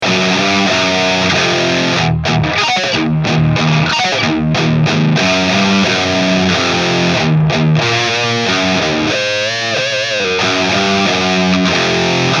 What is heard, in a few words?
harley benton 412; kaappitesti